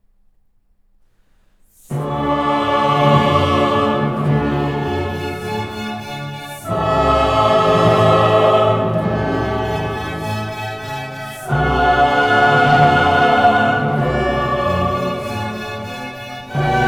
Requiem for soloists, chorus, and orchestra, Sanctus; Mozart